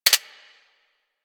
Laser Aim Rifle Low Clip Mech, Weapons; Grineer

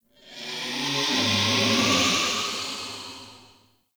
Wraith Night Screech; Ambience; Mendicant Area

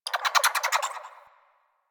Bird Clicky; Ambience; One Offs; Warframe; Eidolon Plains

